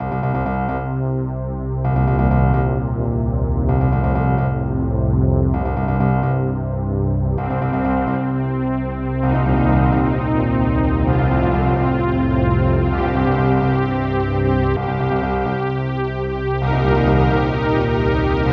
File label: tense; doom